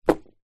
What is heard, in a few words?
foley string loose drop on carpet